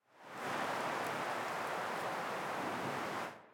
Victory Wind; Smite; Susano

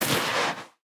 Death Drag; smite; izanami

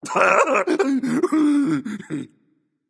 laugh, voicepack; smite; jorm

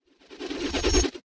dagger return; smite; pele

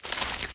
vine pulse; smite; god artemis

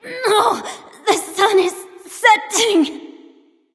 death; smite

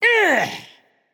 grunt attack; fafnir

